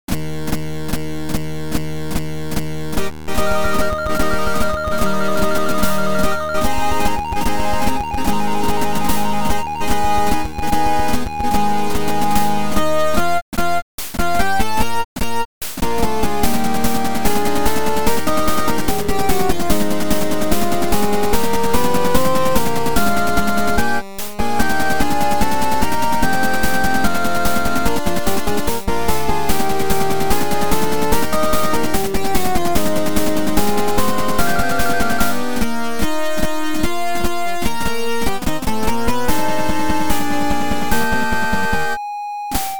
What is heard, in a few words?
fight song 8 bit; vandal giving day